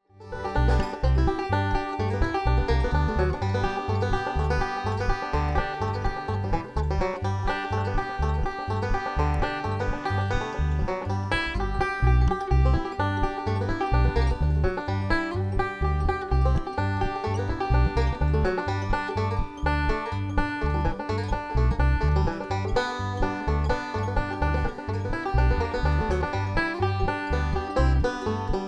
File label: banjo tune